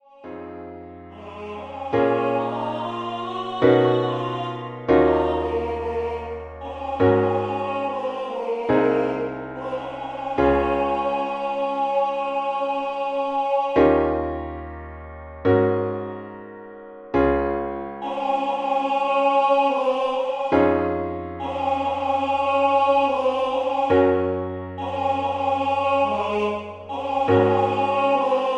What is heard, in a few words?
MIDI SATB